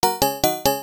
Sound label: Recording State Changed; Mumble